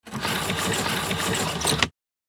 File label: Blind crank lower down squeaky rattle; blinds; gfxsounds